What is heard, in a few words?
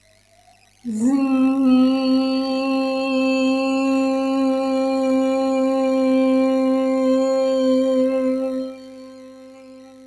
Animal Wild animals Insect Mosquito, vocal imitation